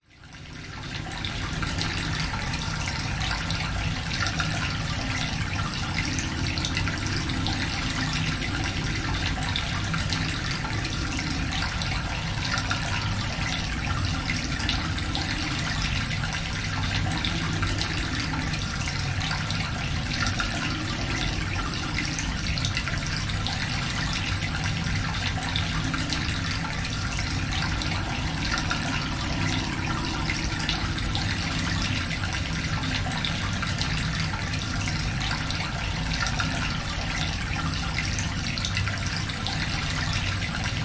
nature cave ambience small chamber water flow drip wind howling through system